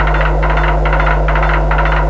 Rhyt Mo, Loops Texture; Ableton Live 12 Lite; Core Library

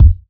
Kick Vinyl Sub

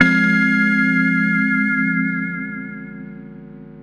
Synth Vibrato Fmin

